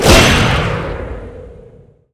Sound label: iceblast release